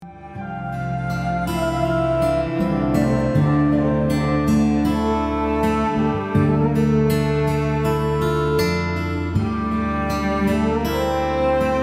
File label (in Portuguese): musica relaxante e natureza